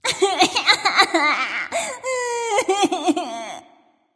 boo hoo; smite

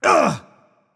grunt hit; smite; poseidon

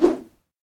minion swing big; smite; npc; roman archer

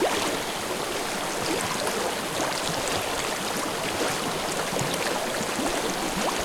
ambience water calm river; smite; conquest

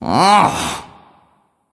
"ugh"; smite; voicepack; sylvanus

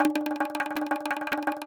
defeat; smite; khepri

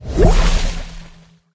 victory water enter; Smite; Sol

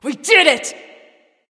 nv yay; smite; awilix

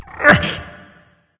nv grunt lift; cernunnos

